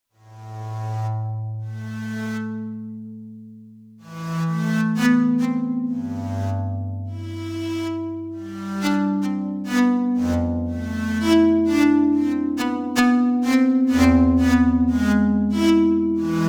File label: C15 Cello